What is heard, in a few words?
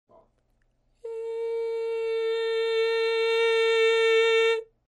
VOX Crescendo